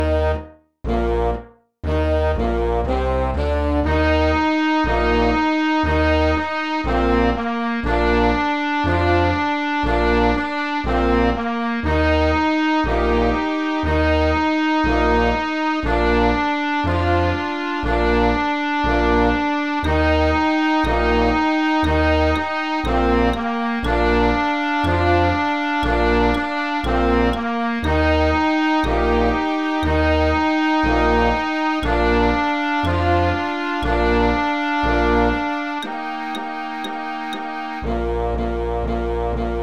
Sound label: country jamboree; Instant Success Music for First Year Band and Strings